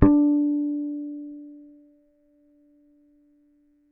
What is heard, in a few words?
Bass one shot Harmonic D, Electric Bass; Slate Digital BASSHOLE Sample Pack